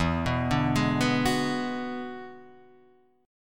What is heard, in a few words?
E 9th E, guitar; E9 Chord {0 2 0 1 0 2} arpeggiated